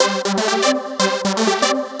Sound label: Trance Synth